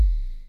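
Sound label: bass drums, synth drums; Syntec; Wall of Sounds; TECHNO-BOX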